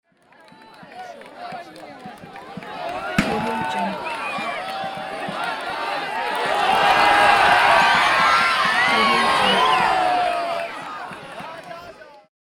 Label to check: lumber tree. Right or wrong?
Wrong